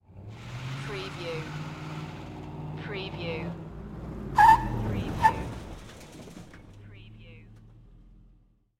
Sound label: Truck 5.3 Liter Driving By And Skidding To A Stop In Reverse